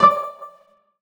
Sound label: tonal oneshot D; Pop; Slate; PASADENA Pop Sample Pack; Tonal One Shots